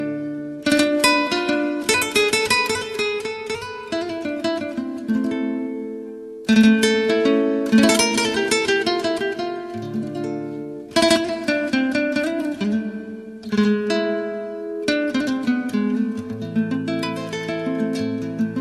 Guitar

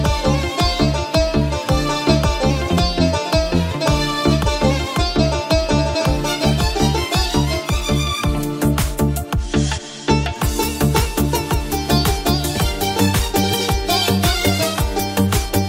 Accordion, play back

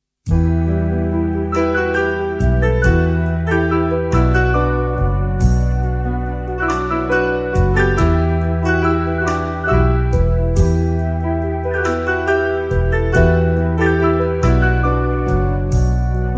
Guitar

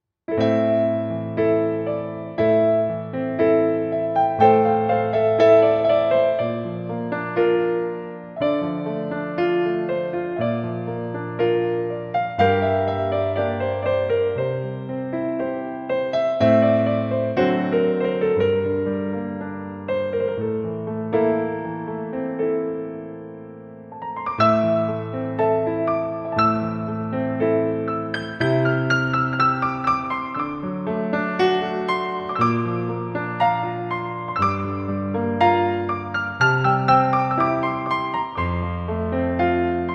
Piano

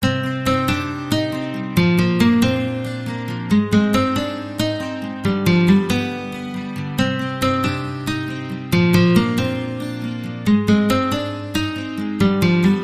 Guitar

